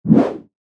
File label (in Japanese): 槍 空振り; 武器効果音